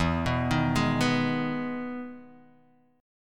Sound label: G sharp Minor E, chord